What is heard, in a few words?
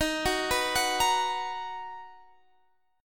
B Major 7th Eb